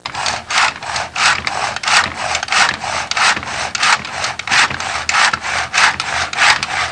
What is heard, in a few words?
hand saw; Sound Effects for Multimedia - Volume 1